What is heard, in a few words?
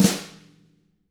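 FLAM, SNARE; ILIO; Double Platinum Drums 1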